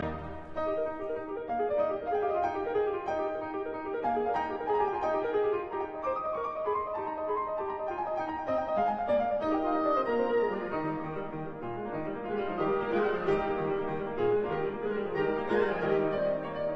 Concerto for 2 Pianos in E flat major, Rondo Allegro